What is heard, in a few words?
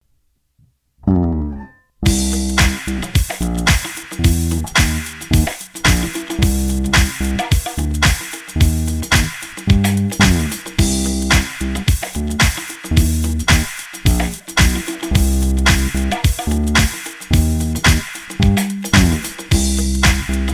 Bass Sample